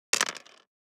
DICE on Plastic, Throw and Roll, Standard, 3 Three Dice; Samples; ArticulatedSounds; DICE Sfx Party (Extended)